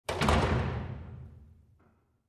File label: Metal Door Close Off, push bar